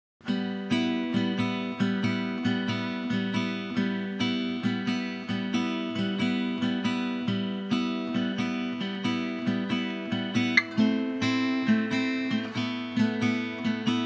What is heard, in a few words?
137 BPM E Min Backing Guitar; Cymatics; Miami Guitar Pack - Pt 2; Snakes